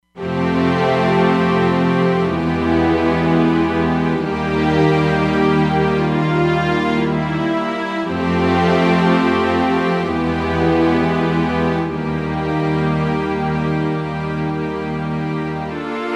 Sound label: String, Roland Fantom XR